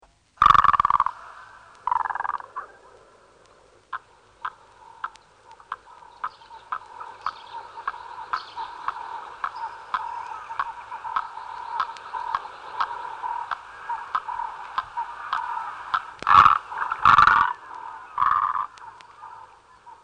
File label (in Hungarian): Nagy kуcsag; Madarak